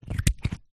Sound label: hand damp fingers movement; human; zapsplat